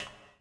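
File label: reverb walk, footsteps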